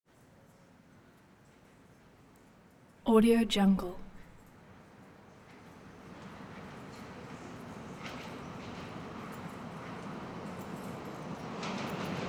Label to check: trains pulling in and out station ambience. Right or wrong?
Right